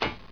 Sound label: ladder, player; mistake coop